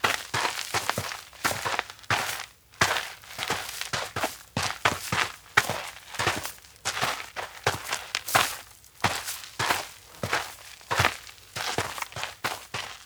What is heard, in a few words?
steps outdoor forest push walk; koyomi; anemoi